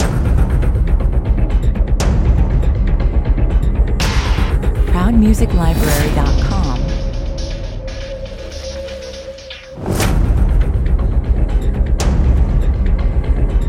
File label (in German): Dramatischer, spannungsgeladener Trailer für Verfolgungsjagden, schnelle Schnittbilder; militärische Operationen; Kräftige Hits; Dramatischer, spannungsgeladener Trailer für Verfolgungsjagden, schnelle Schnittbilder und militärische Operationen